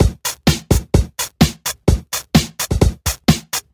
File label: House Beat, 128 BPM